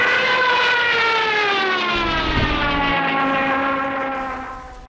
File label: ju87 siren; tankwar